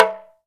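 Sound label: talk drum, 2G; Heart of Africa 1; Spectrasonics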